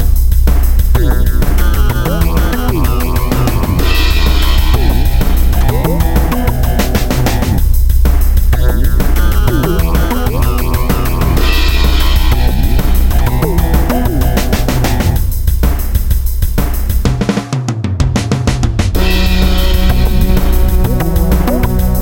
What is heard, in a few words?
Dungeon Boss Music; The Captives Plot of the Demiurge